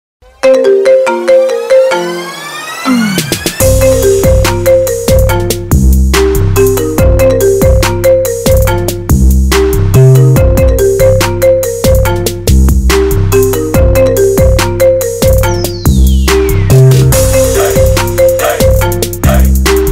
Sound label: iPhone Marimba Zil Sesi Trap Remix